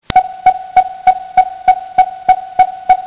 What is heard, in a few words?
sonar